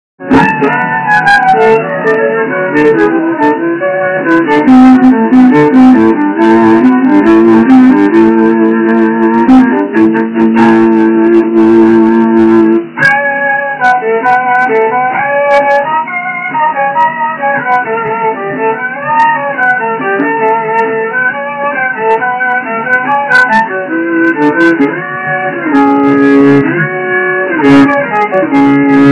Unfinished Concerto violin home recording

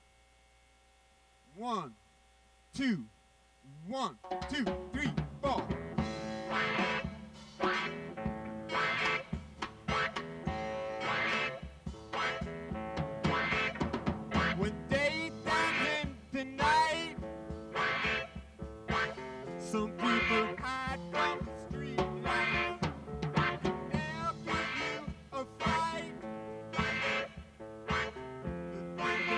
Reggae; Made in USA

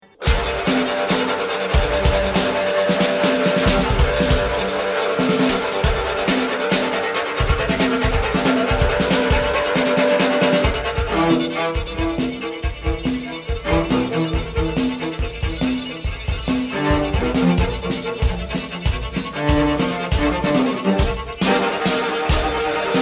String Quartet

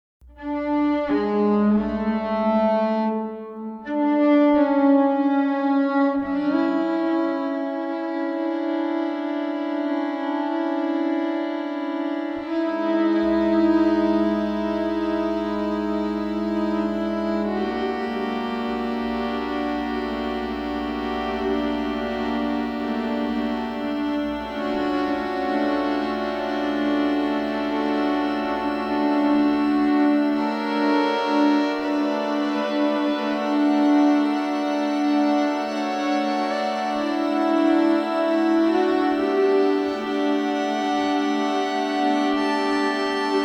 Six Violins

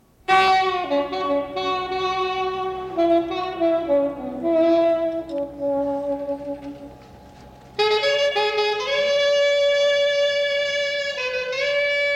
sax solo

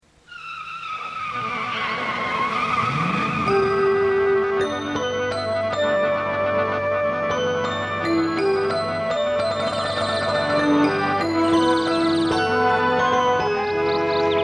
Backing

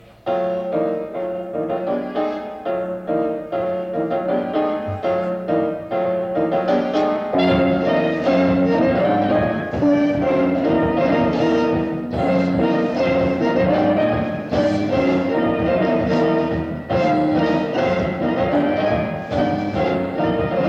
piano with band

